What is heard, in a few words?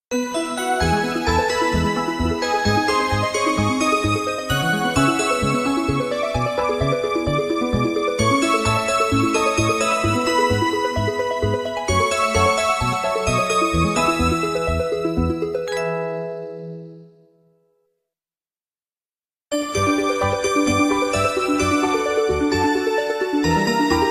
ringtone